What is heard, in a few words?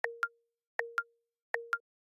apple watch navigation left turn